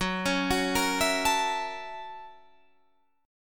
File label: Gb 7th Sharp 9th Gb Guitar Open D